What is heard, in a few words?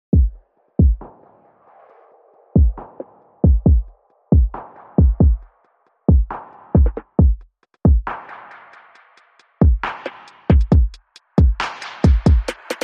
Drums Bass and SFX Only